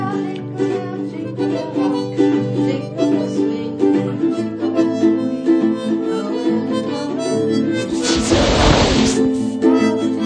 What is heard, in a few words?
St Thomas More